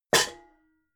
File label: pots hit hard